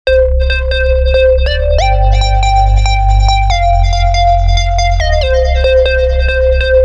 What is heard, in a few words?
Synth Melody Melody And Bass Sequence